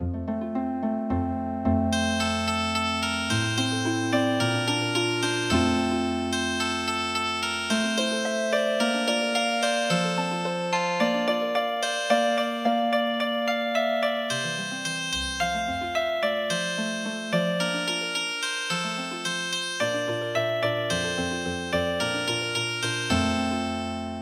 singing bird morning Healing Harp

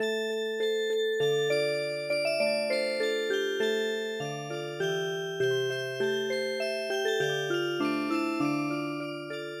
Music Box; Waiting Mother

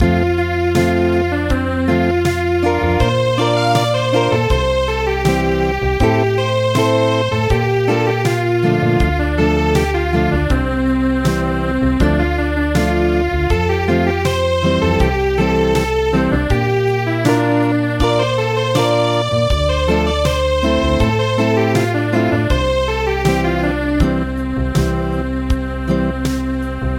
Acoustic, midi traditional

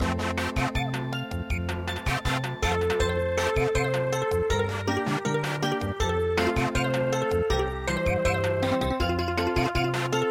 Samba

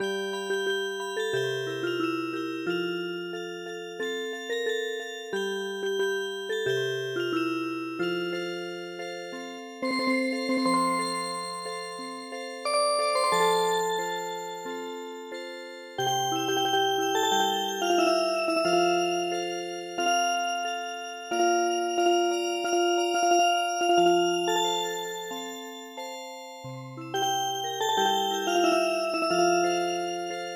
Music Box, midi; HOE HOE NA WA A

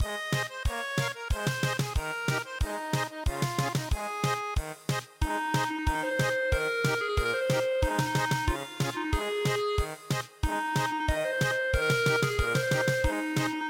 German Polka

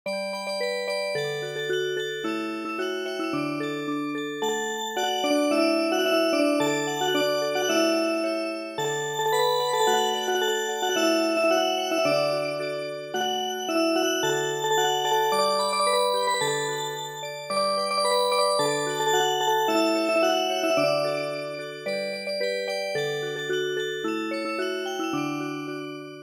monkey crab Music Box

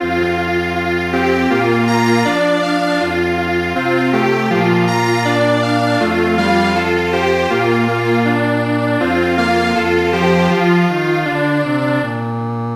Strings Quartet

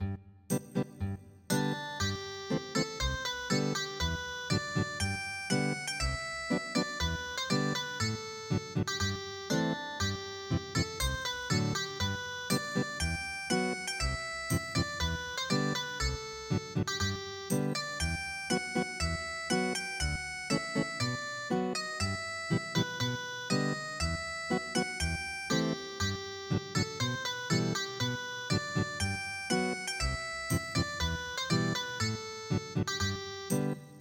Ethnic Guitar, traditional

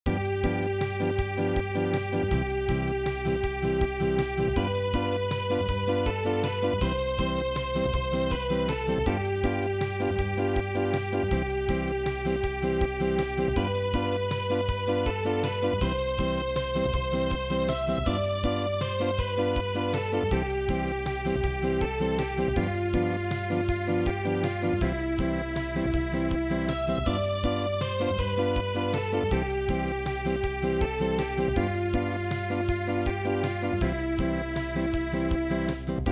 Unplugged

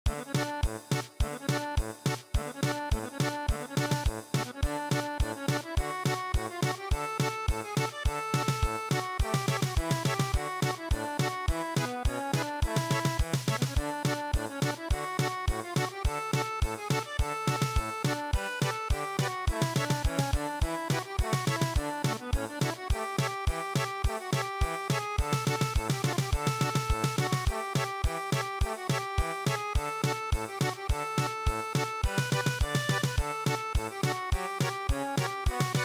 German Polka

